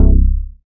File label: BASS STER.